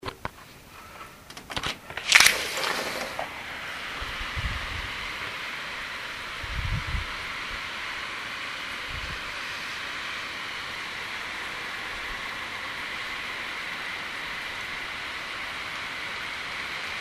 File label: windstorm